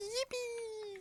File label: yippeeee